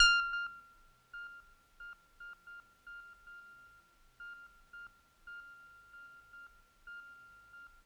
Kawaii F5; keys

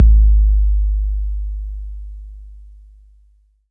BD 808 Tape Decay

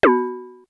Synth, Techno Blip

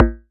Perc; EFM

